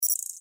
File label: subtle tech interface